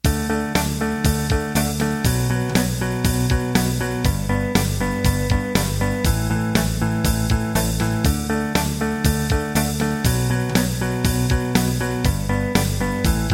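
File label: Country Rock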